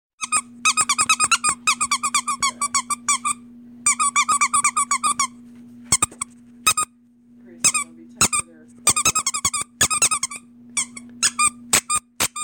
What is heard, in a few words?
squeaky toy